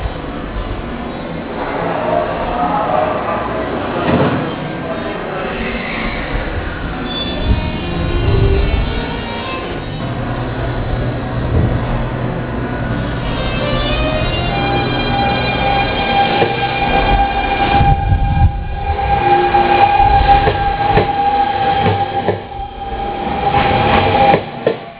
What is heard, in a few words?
vvvf